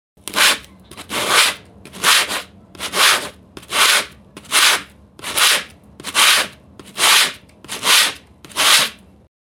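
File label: sandpaper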